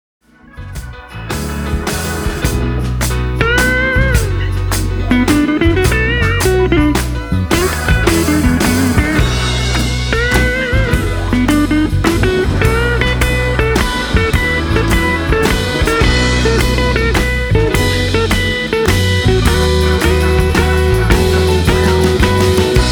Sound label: Electric Guitar Blues